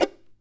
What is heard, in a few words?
CHARANGO FX